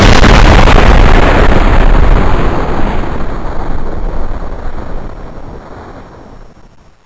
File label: explode slow